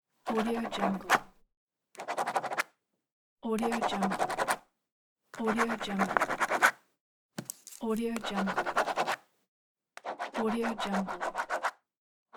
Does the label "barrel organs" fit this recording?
No